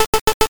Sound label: chew